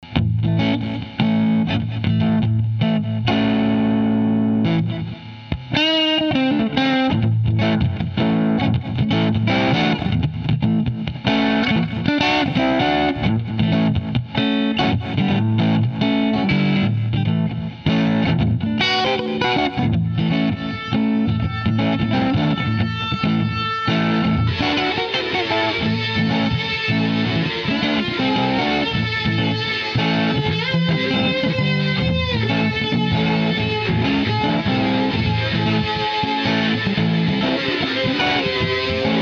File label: Blues